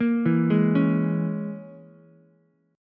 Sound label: E 6th Flat 5th E down Ukulele; E6b5 arpeggiated; JGuitar